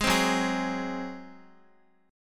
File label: Gb Suspended 2nd Flat 5th Guitar 4E,4A,5D,5G,5C,6F; Gbsus2b5 strummed